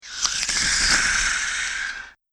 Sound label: Hiss Nasty Fiend, monster; Monster Sound Effects; Andrew Fleming